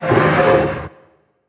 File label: HUSKEDCRAWLER idle